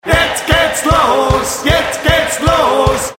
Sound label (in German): Jingels